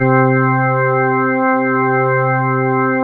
DW8 ORG C3, Korg; Back In Time Records; Korg Universe VOL-1; DW8000

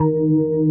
ORGN 123, Keys; Houseworx

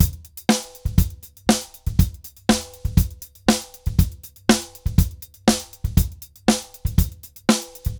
Drums Samba 120 bpm; Best Service; Festa Latina VOL-1

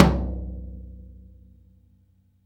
TOM E, Drum Dry; East Collexion; Drum 1

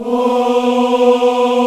AFROAH B 3, AF.VOWELS; Spectrasonics; Heart of Africa 1

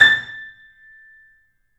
The Piano; InVision Interactive - The Piano

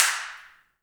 handclaps, Heart of Africa

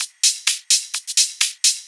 Hihat Loop 128 BPM; Best Service; House Definition VOL-1

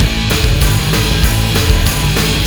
band track; Vermin; Sonic Foundry (Sony Creative Software); Ugly Remnants VOL-2

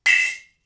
Splash, drums singles; The Roots Drumloops

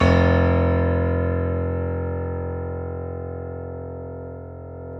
KEY F 0, YC7 Piano mf; Roland L-CD701

